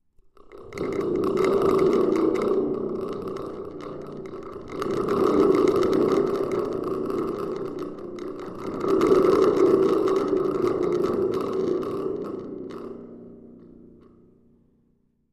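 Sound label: Spring Drum Small Shake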